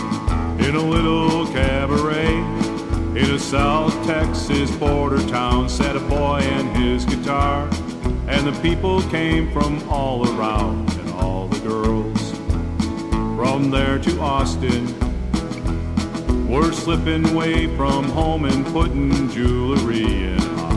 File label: Flat Top Box